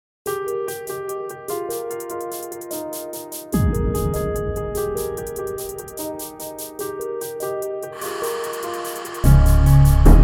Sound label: INST